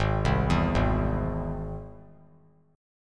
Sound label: Ab Augmented Ab; Ab+ arpeggiated; JGuitar